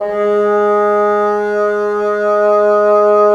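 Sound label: wind bassoon; LA Composer vol. 3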